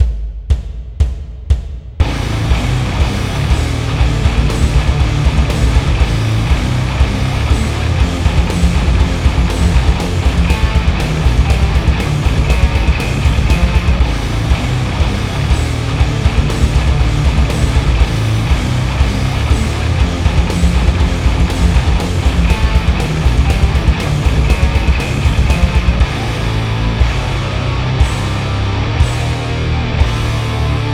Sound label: 5-string Riff